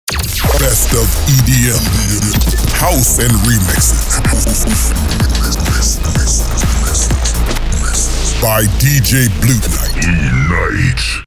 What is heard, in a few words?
EDM House Remixes with Effect